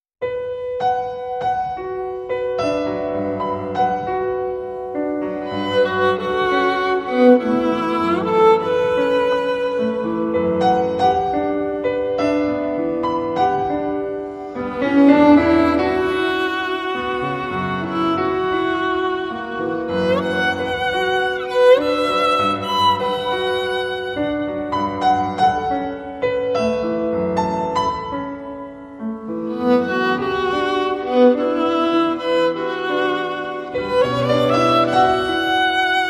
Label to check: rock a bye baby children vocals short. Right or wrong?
Wrong